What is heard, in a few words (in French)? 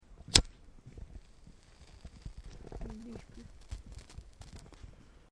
allumer feu